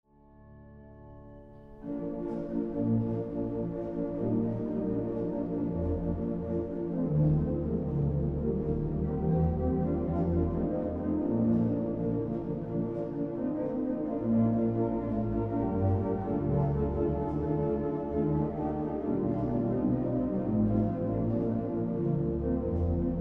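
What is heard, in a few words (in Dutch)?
Melodie Bewerking, orgel; Een Naam Is Onze Hope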